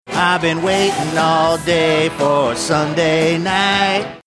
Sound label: singing i've been waiting all day for sunday night